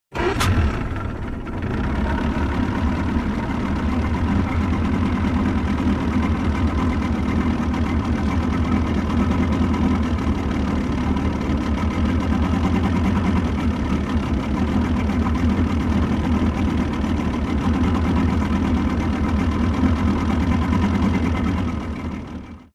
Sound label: motorboat start idle